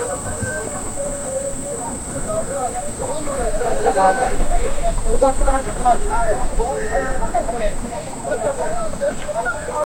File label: an ancient busy middle ea; middle eastern